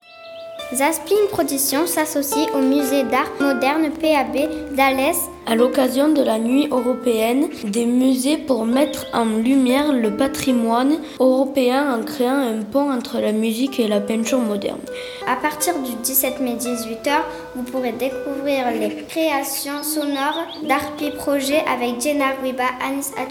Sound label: Jingle harpy